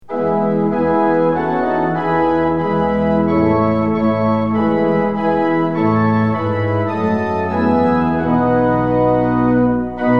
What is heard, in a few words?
tune; hymns; lillenas